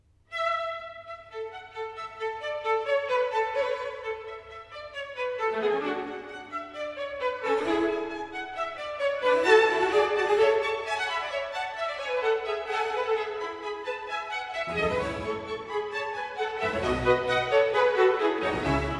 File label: Concerto Grosso in A major, Allegro; Handel Masterworks; Klassika